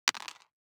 DICE on Cardboard, Throw and Roll, Mini, 2 Two Dice; Samples; ArticulatedSounds; DICE Sfx Party (Extended)